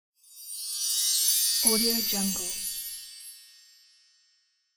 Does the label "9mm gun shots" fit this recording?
No